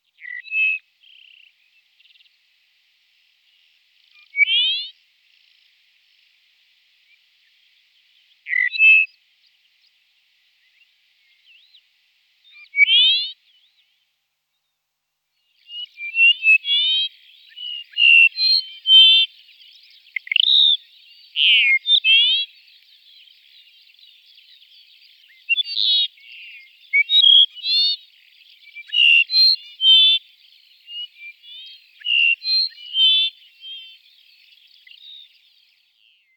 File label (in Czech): Skrivan Dupontuv Chersophilus duponti